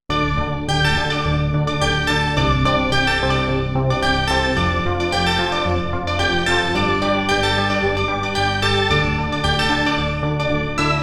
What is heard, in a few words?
Hit Chords